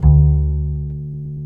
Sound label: BASS, 150 BPM